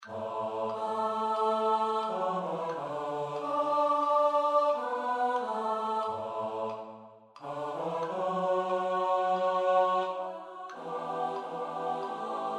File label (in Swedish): Följ mig bortåt vägen, SATB